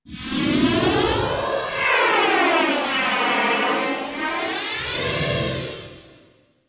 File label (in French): Teleportation magie